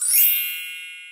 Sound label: fairy twinkle